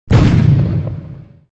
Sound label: Crash; Fancy Pants World 1 Remix